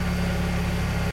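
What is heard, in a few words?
smoothly evenly running motor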